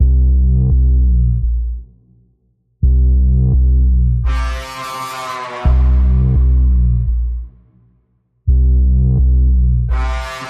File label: Insidious Pulse Bends C, Key of C